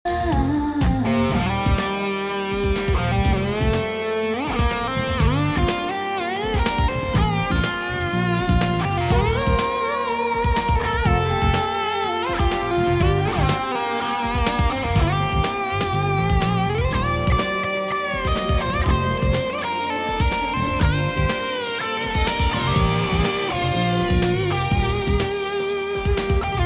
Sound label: Lead